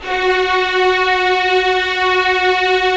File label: STR VLS, Violins; Roland - LA Composer vol. 4; 90 s Sample CDs